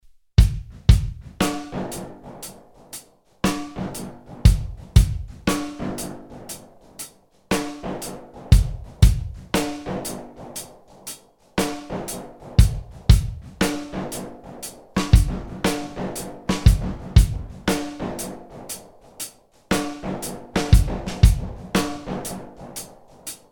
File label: Drum Loop